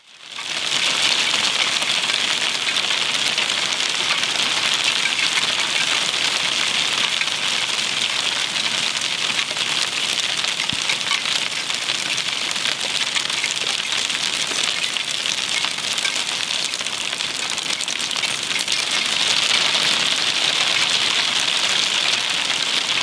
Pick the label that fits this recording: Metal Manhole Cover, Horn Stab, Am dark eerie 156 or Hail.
Hail